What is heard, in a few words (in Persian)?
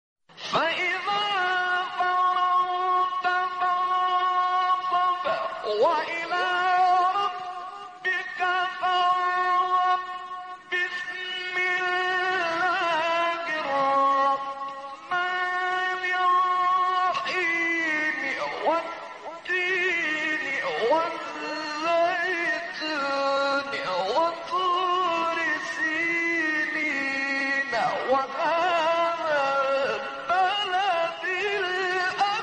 تلاوت زیبای سوره تین